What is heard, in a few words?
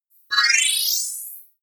appear sparkle short